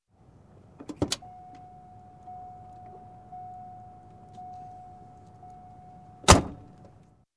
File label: Open and closing car door